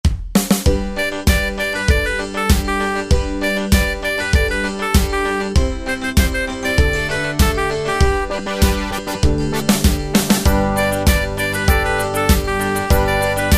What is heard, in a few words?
Mandolina